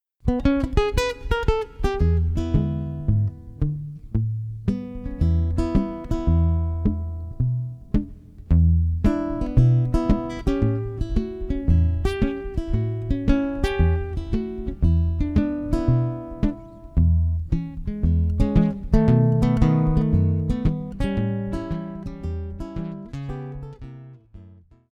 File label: Blues